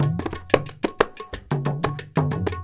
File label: INDIA L; Spectrasonics - Heart of Asia CD2